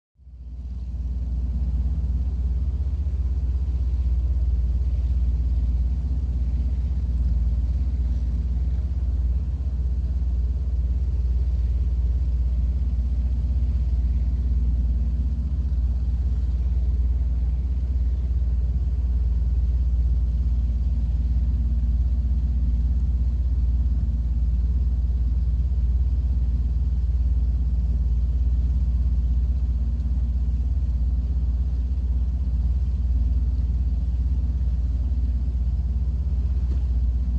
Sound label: Power Boat Int Idle C, off shore power boat